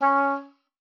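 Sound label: C#4, oboe d'amore; Xsample VOL-7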